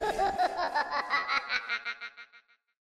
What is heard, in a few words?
Laugh Huahaha